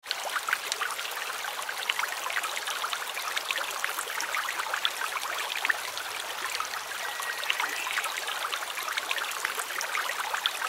River ambience water flowing gently and calmly

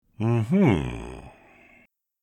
Old man thinking vocalization

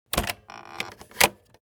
Radio cassette in

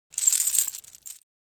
Metal necklace jewelry movement

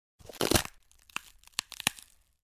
Bone breaking slowly with ligaments stretching

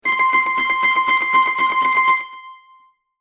Dulcimer high pitch tremolo medium

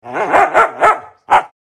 Scared dog bark

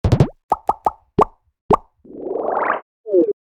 Infographic graph build up pops whooshes

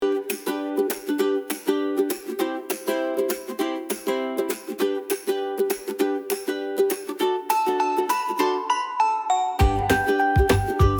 Playful positive rainbow parade

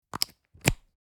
Headphone jack insert; headphones and speakers

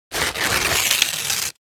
Tear paper slow

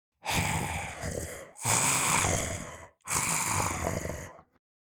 Demon beast imp monster breathing

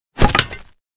Drop battle axe on dirt

